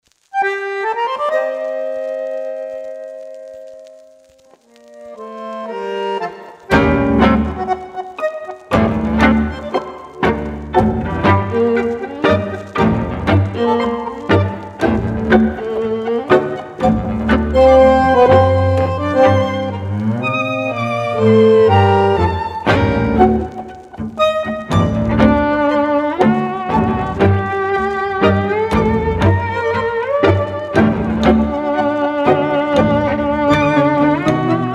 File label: tango Music aged